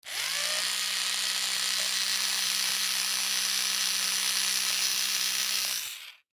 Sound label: Toy Drill Electric, Toy Drill Electric On Run Off Struggle; Miscellaneous Toy; Foley; Miscellaneous Toy Sound Effects